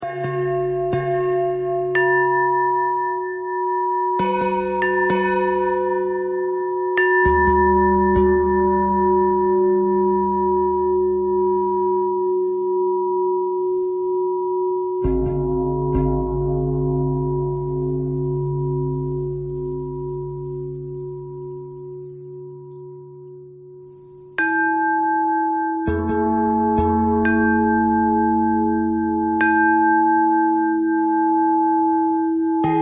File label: bell bell thread noise